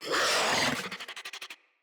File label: Infested Charger Attack, Enemies